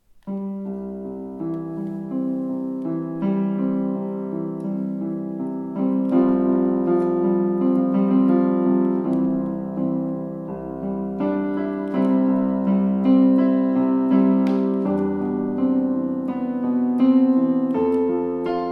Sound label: Improvisation